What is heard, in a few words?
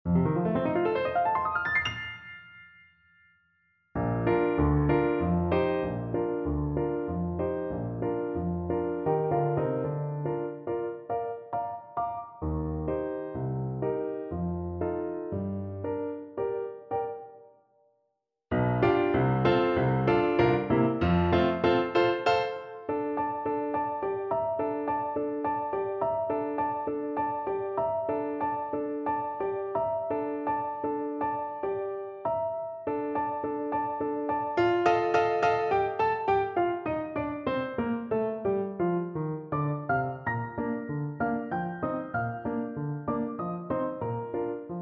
tra voi belle, Flute